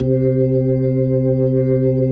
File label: B-3 Jazz; Keyboards of The 60's and 70's